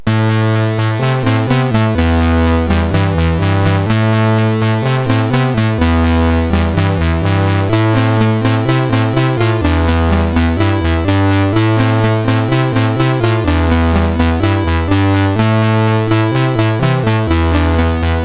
Grfx Jump, MOS6581R3; hvsc

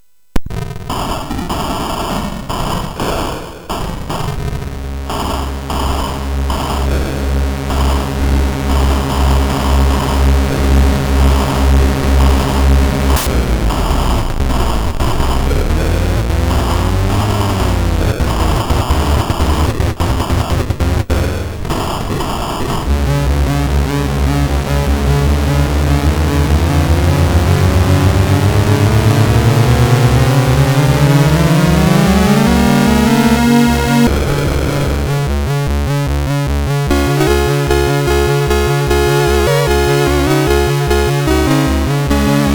Battle Tune, MOS6581R3

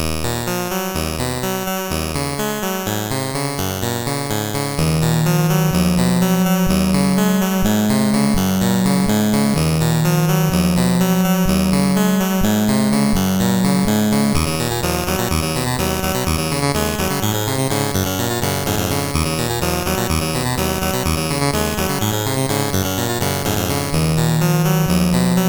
Yolkish Tune, sid, 6581

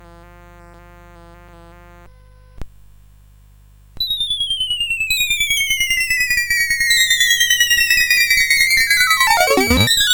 Descending Glop, CSG8580R5

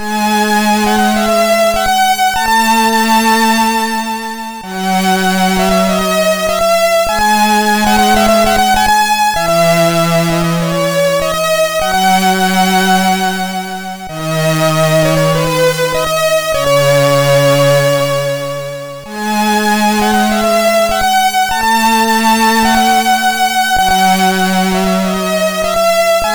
Slow, MOS 6581 SID

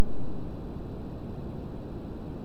sid MOS6581R2, GAMES; Face Off

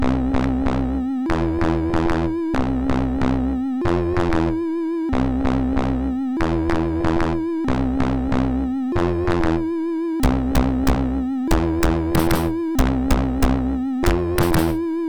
Morse Code, MOS6581R3; hvsc

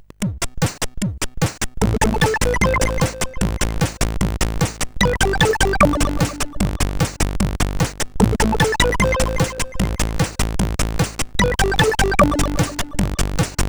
sid CSG8580R5

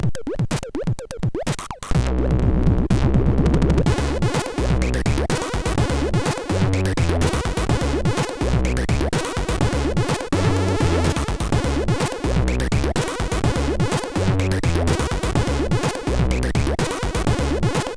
Ode in D, sid MOS6581R3

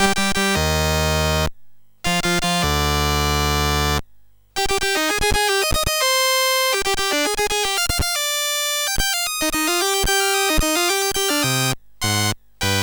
Beethoven Sinfonia No 5 BASIC, MOS6581R2

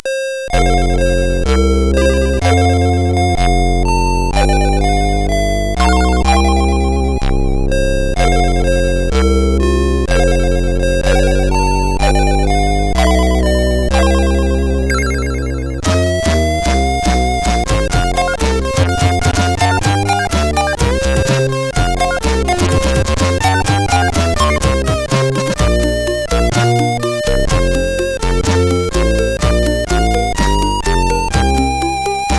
National Anthem, sid CSG8580R5

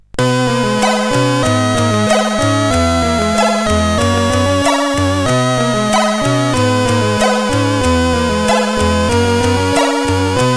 Boing, CSG8580R5; hvsc

